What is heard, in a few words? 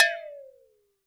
WATERBEL, WATERBELL; Univers Sons - Raricussions